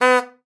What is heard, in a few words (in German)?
HUPE; Xsample VOL-9